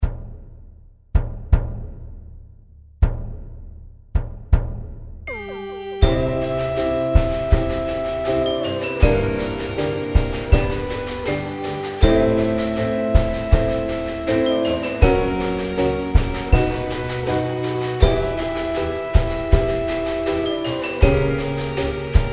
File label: Sci Fi Piano Thang; Dan Warren